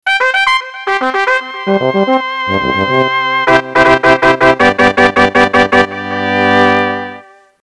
Harvest Ceremony Fanfare

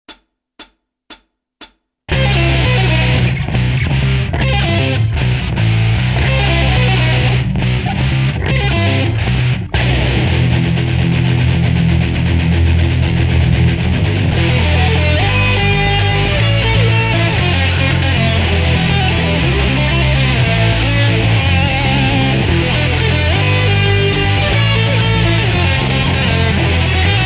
Drum Minus Track

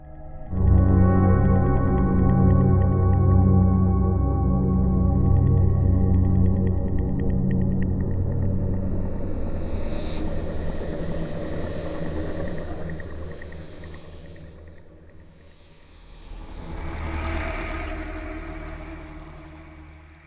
atmosphere

